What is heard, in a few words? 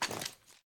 ar reload empty fast lower, weapons; viper